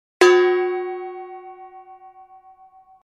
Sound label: frying pan; ضربه زدن با ماهیتابه